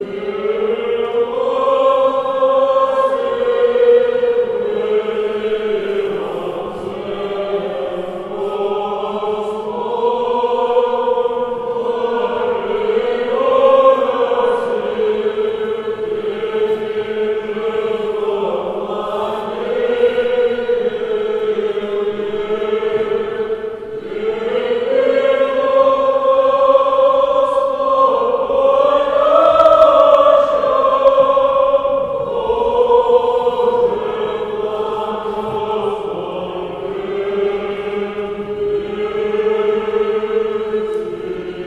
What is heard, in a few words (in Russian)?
Рогожский хор 5 глас ирмос 6 песни